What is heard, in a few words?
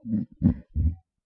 pig; fauna